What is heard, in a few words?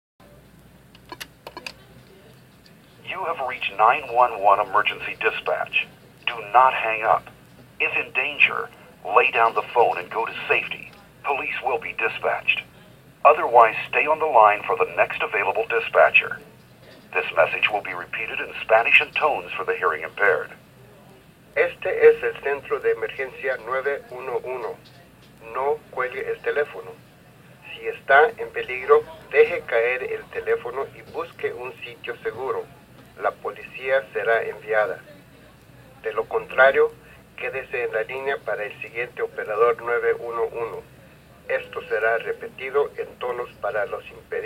911 hold recording